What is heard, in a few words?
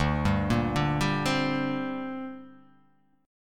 D 7th Suspended 4th Sharp 5th, down